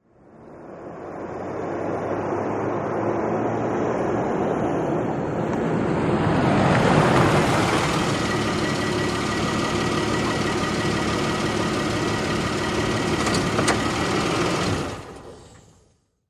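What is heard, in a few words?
SUV Forward Park Maneuver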